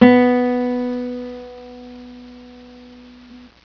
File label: tune 2 b, guitar tuner; Tom Kjeldsen